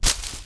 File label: bush; Mafie 1